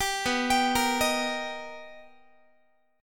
B Augmented Major 7th B down Guitar; B+M7; arpeggiated; 22,19,22,20,19,x; 4A,4E,5A,5D,5G#,6C#

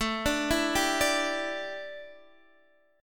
A 7th Suspended 4th Guitar Open D, chord

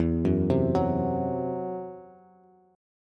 E Suspended 2nd Sharp 5th, Bass; Esus2#5 arpeggiated; 12,9,10,11

